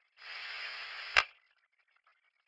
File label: radio static